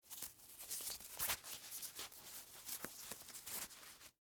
Track Pants Tie, Foley, Clothes, Track, Pants, Tie, Draw, String; Dressing Sound Effects; SoundEffects-BlastWave